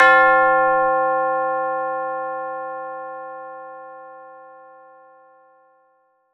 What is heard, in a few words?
Tubular Bells; Roland M-OC1